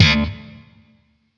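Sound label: Sentient Shawzin Slap Octave Two D; Chords; Warframe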